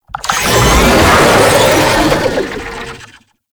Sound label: Infested Vocal Idle; Warframe